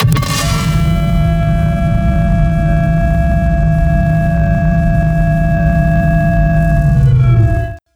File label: Dron